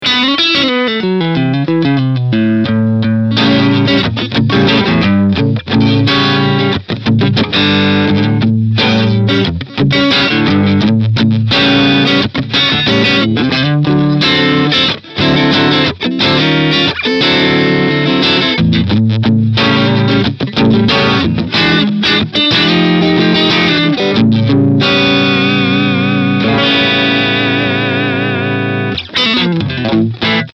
AC30 Top Boost

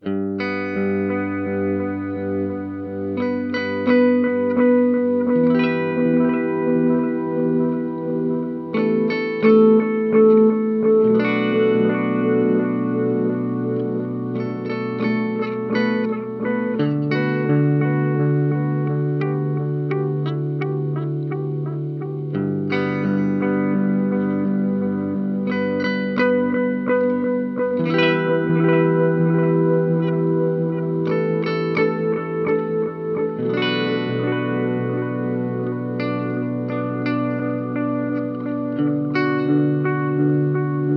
Boss DM 101 Modern

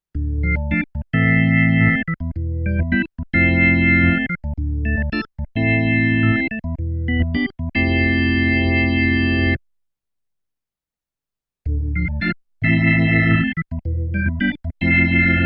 Chorus

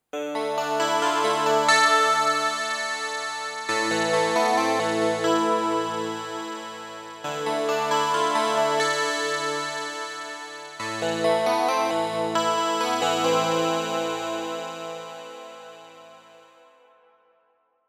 Sitar; Pro Vs Mini

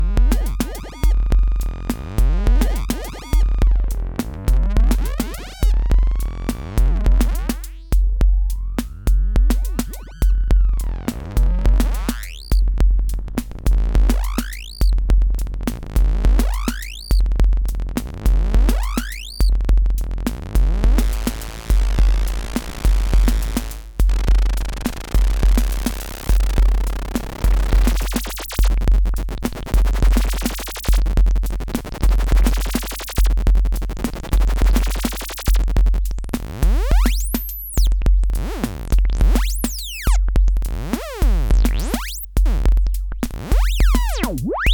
Monotribe Mobile In

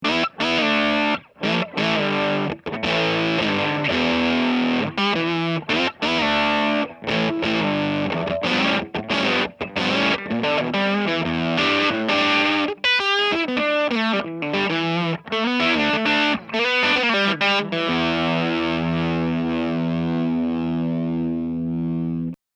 mxr gain Variac 15 V

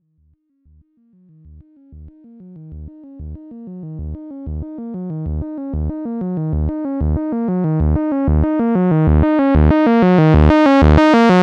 syrinx dry saw

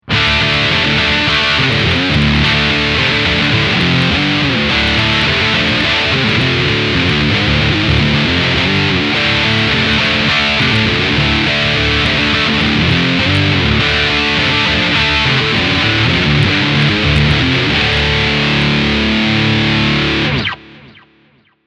Metal Heavy Country

